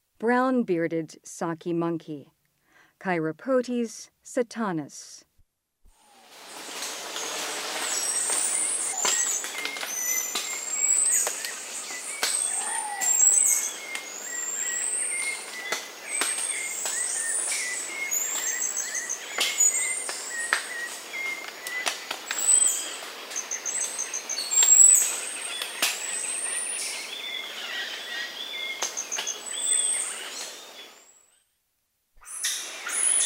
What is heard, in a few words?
Brown bearded saki monkey; S. America; Rainforest; Primates of the Rainforest